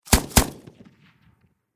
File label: Warfare Machine Gun Pkm Russian Double Shot Close Perspective; Machine Gun Sound Effects; SFX Bible 6